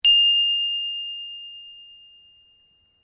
Bell Handbell Priest Japanese Ring, Japanese priest bell; Bells (Sound Effects:Musical)